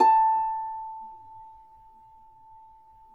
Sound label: A4 HARP; USB Soundscan vol.62; AKAI